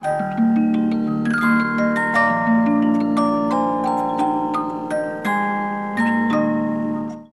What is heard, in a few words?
Music box play